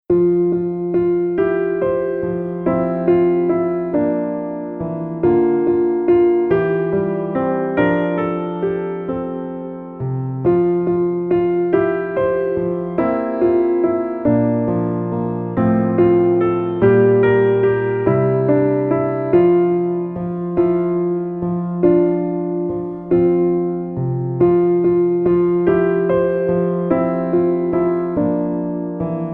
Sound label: Vals